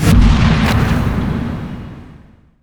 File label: crystal nova; crystal maiden; yulsaria